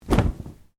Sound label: Cloth Flap Single, Material flapping to simulate a flag or sails; Dressing; Sound Effects:Human